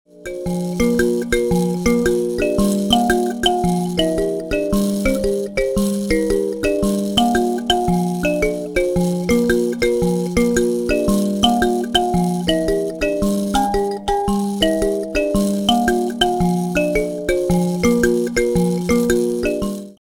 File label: mbira